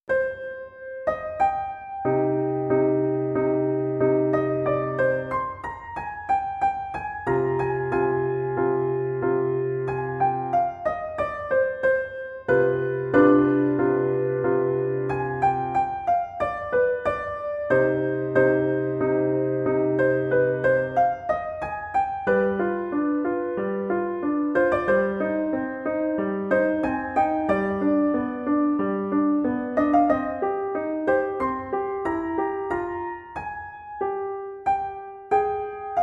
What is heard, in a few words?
Duo Vl Vla; Hoffmeister; Op.13-2